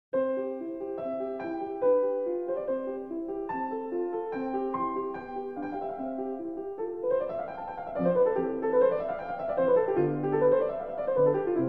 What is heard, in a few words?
Piano Sonata in C Major, Allegro; No. 16; Sonata Facile